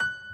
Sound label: piano sus